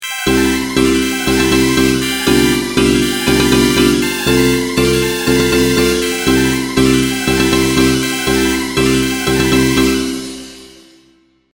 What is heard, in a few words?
whimsical starlight adventure, loops